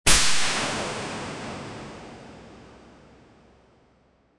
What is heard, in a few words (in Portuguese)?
efeito de flash, light